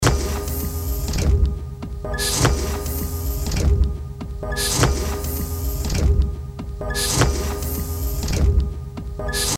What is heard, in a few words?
musical fx loop